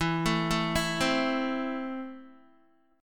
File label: E Suspended 4th Sharp 5th, Guitar; Esus4#5 arpeggiated